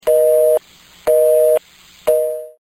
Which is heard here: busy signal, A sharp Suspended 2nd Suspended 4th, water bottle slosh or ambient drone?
busy signal